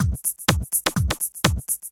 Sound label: Loop